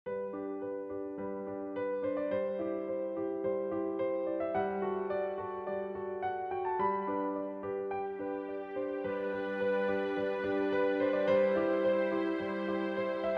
Narrow Piano Orch Mix

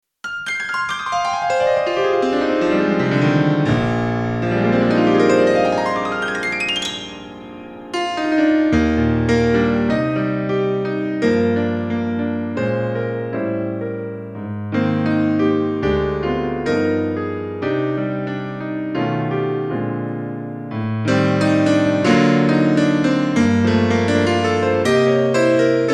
Kronos; OASYS Kronos Full

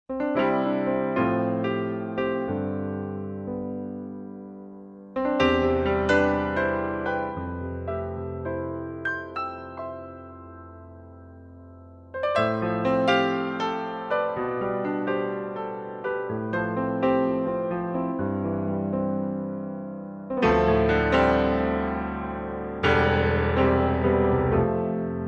Baldwin comparison

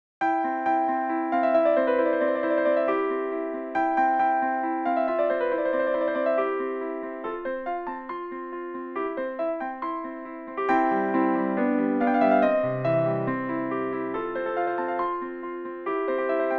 XS XF Small

